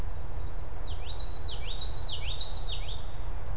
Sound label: Creature Calls